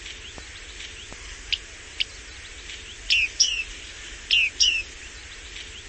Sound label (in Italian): Basettino Panurus biarmicus